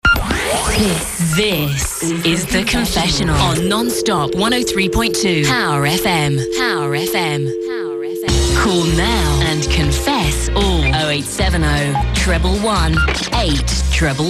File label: Jingle, Long Mix; Jezzas Confessions